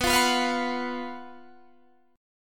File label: F sharp Major 7th Suspended 4th, B, chord